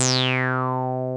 303 REZ; Pro-Rec - Synthsonic

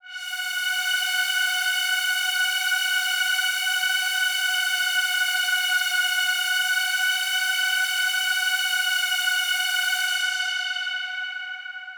Big Pad F#4; KG MegaSaur

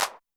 clap, Zoom MRT-3B

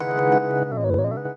Chords Tones; Equipped Music; Loungin House

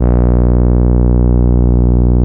TAURUS C3, synthesizer; Hollywood Edge - Giorgio Moroder Rare Synthesizer Collection

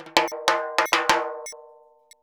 100 TIMBA, Modern Percussion Loops; Soundscan vol.56; AKAI

